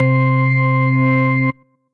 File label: Organ, Tonewheel Organ; Electric Keyboards; Organ Power Ball; Ableton; Factory Packs